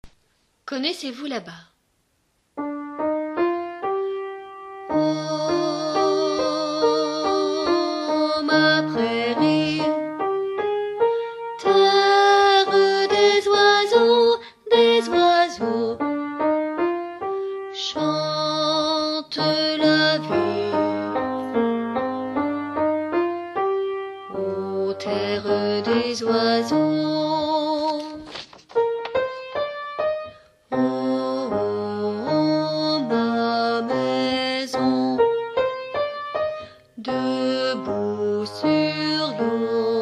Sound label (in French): connaissez vous, basses, chorale